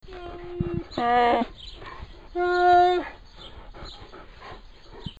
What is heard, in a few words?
black bear cub sounds